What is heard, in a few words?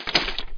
step rubble; SpecOps2 MPlayer Demo